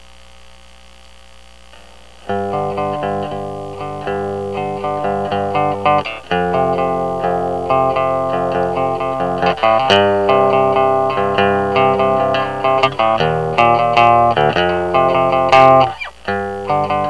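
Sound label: inside outscratch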